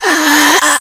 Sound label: death, infected